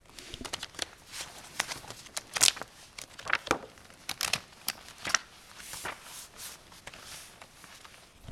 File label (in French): pages qui tournent; Les ecoles